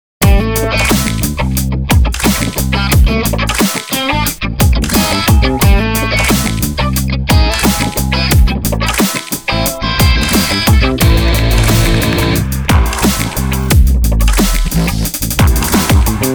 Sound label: ROCK; Butcher